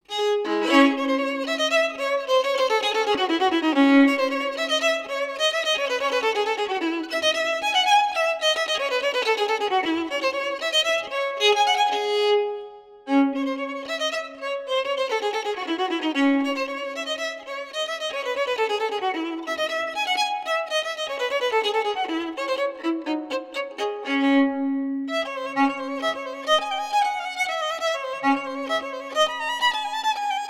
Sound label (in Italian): Violino barocco